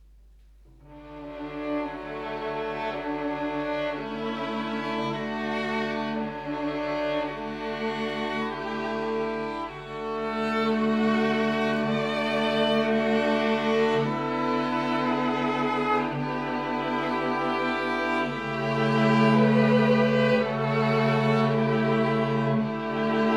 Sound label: String trio